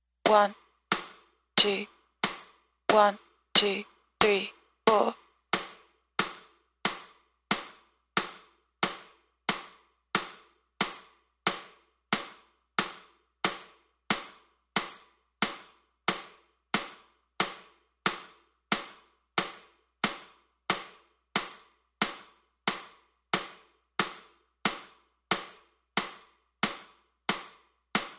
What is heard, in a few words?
metronome count; 4 count head